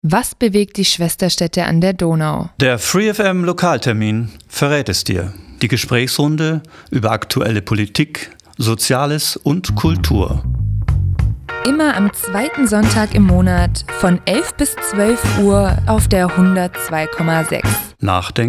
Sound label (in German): opener; lokaltermin